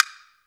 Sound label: block, wood block; AKAI S-Series CD-ROM Sound Library VOL-5